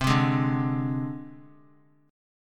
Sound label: C Suspended 2nd Sharp 5th, down, Guitar; Csus2#5 strummed